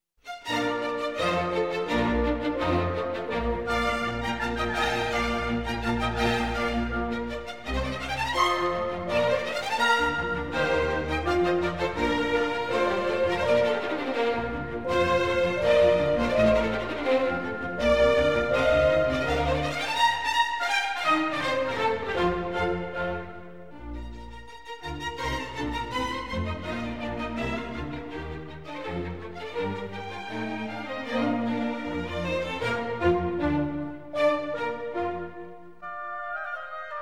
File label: Violin Concerto in B-Flat Major, Presto; Mozart; Violin Concerto No. 1